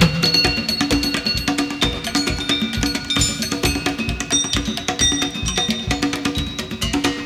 Basis 132, Percussion Combos, Abstract World Fusion; M-Audio; Pro Sessions VOL-38